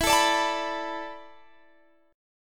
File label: E 7th Suspended 4th, Guitar, Open G